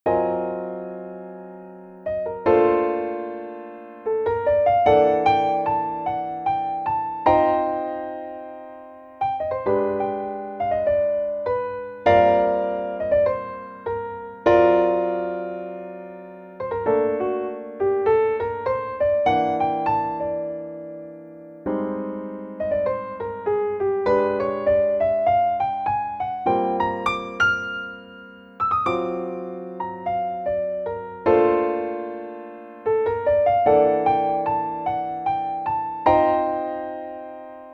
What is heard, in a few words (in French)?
Piano seul